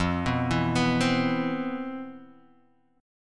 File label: C Major 7th, F, guitar chord; Cmaj7/F arpeggiated; JGuitar